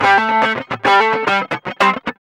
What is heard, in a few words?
GUI, LICKS; 110 LICKS